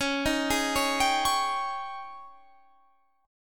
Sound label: G sharp Major 7th Suspended 4th, C sharp